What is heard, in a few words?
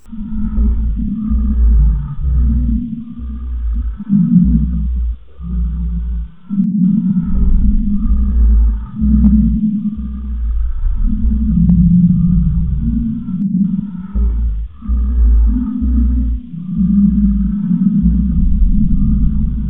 Haunting